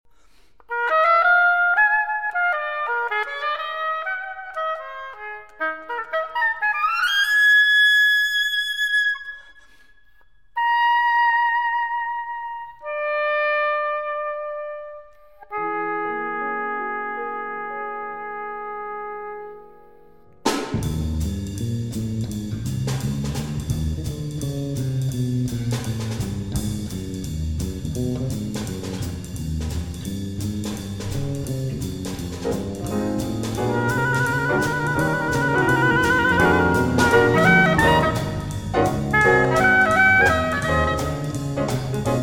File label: Blues